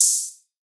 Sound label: Wide Open Hihat; trap; Hihats; Cymatics; Trap Starter Pack